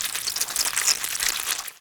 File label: roach walk; turkmusic